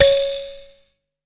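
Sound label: kalimba C#4, ETHNIC; AKAI S-Series CD-ROM Sound Library VOL-8; SET#1 ETHNIC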